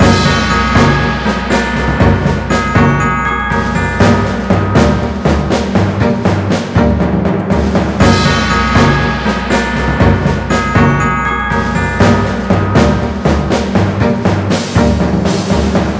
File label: Stage Clear Loop; Vritra